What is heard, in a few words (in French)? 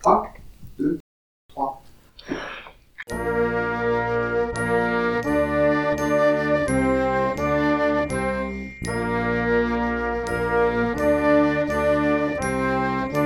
a la claire fontaine noire a 80